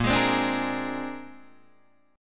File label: A sharp 9th A sharp, Guitar Open D